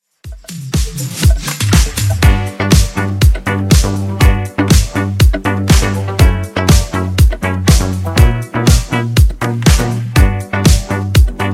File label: Funk 121 Bpm